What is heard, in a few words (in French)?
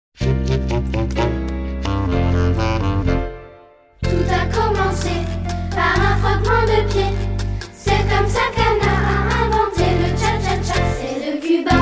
cha cha cha chant; la rentree en musique 2025